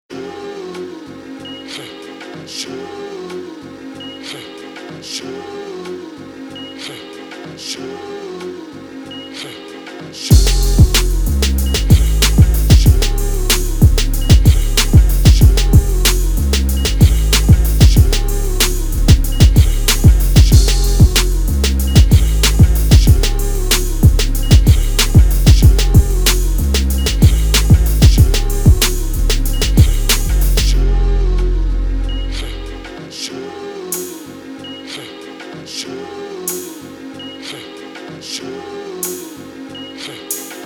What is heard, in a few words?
Instrumental